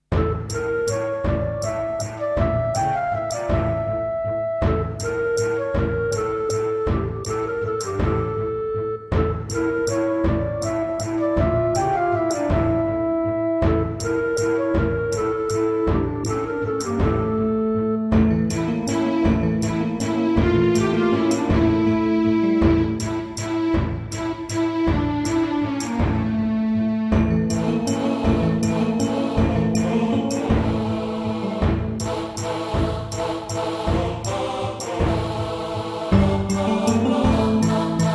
Korg X5DR

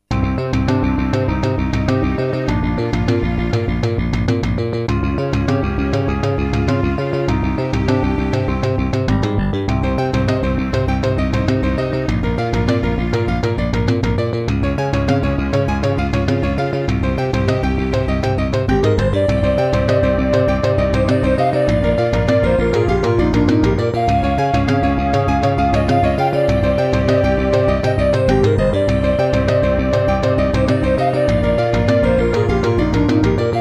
Hizon DB333; Hocus Pocus

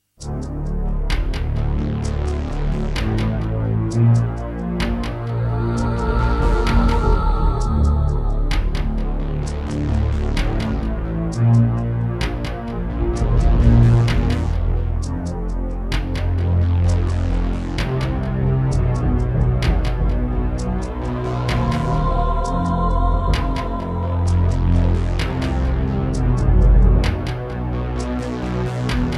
NEC XR385 (DB60XG Clone) GSMODE; Descent II

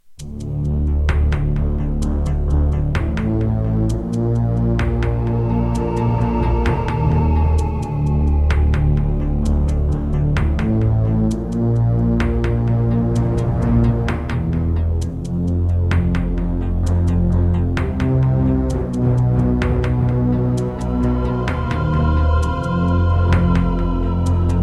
Casio GZ-50M; Descent II

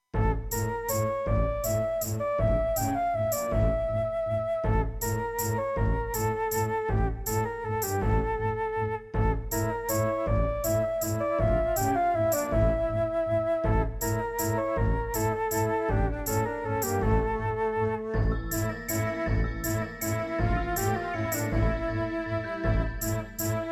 Serdaco X3MB Buran

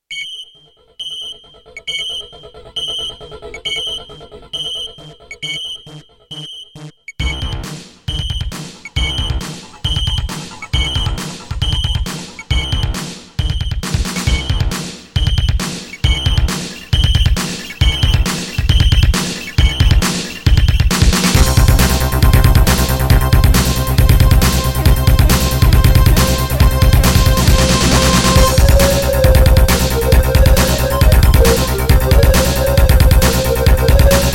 MidiPlus MiniEngine USB; System Shock - Intro

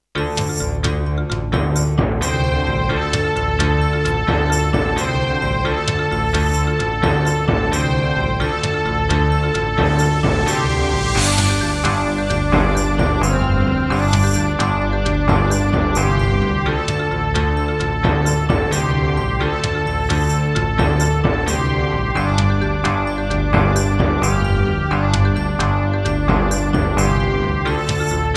Hammond GM-1000, wavetable; Heretic

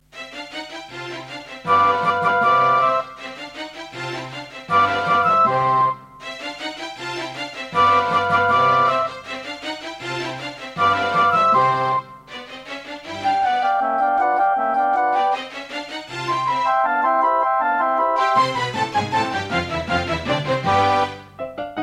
Korg X5DR; classical; Mozart; Concerto K467 partial

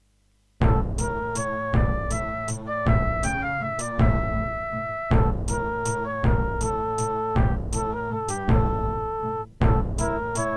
Serdaco McQub

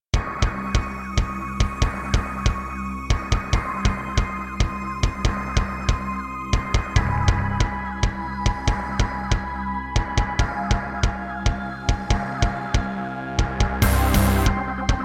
Yamaha MU500 GSMODE; sigil doom episode 5

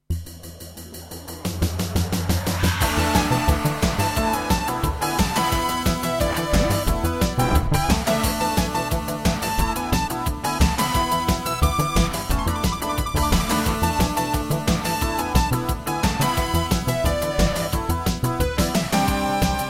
Intro [Roland MT-32]; Willy Beamish

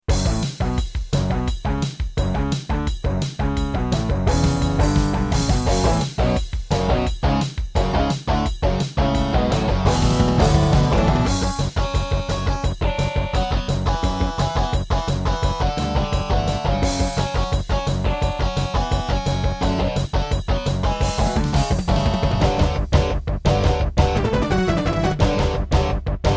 Turtle Beach Maui; Duke Nukem 3D

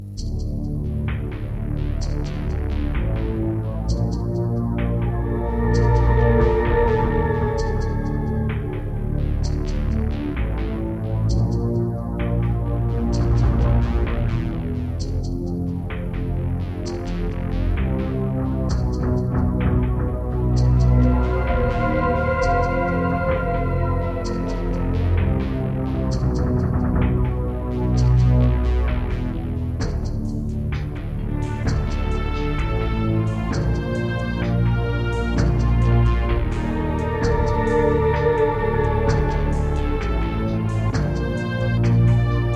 Creative Wave Blaster II; Descent II

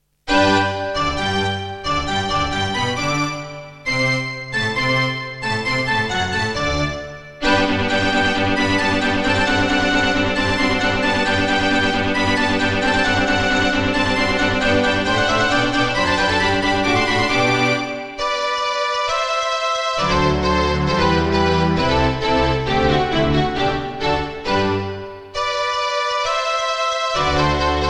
Tascam Pocketstudio 5; Mozart - Eine Kleine Nachtmusik partial; classical

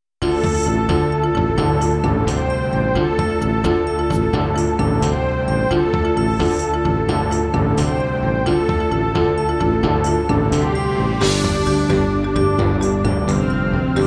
Roland SD-90 GS MODE, wavetable; Heretic

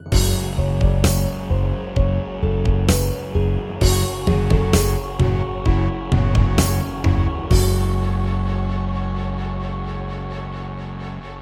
Yamaha SHS-500; Doom (Sigil) - Introduction

